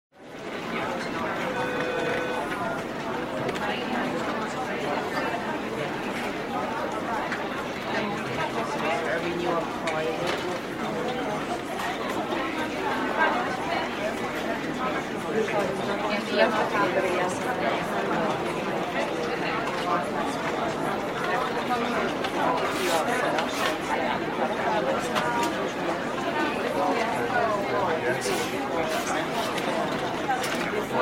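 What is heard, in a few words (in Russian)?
общие звуки офиса. голоса звонки работа на компьютере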